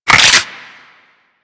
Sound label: Lever Action Rifle Reload B; Grineer